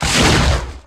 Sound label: stomp light; gmod; lvs star wars; atte